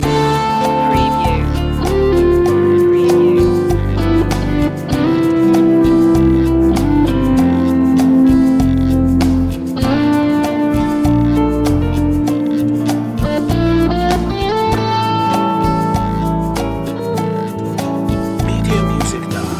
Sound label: romantic guitar loop